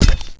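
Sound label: hit body, weapons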